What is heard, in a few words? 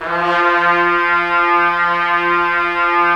Trumpets; Roland - LA Composer vol. 4